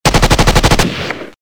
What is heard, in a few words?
machine gun